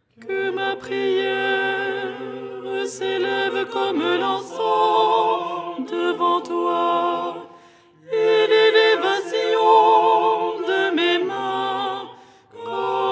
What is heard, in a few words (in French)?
alto; " Que ma prière s'élève…"; Les 8 tons en français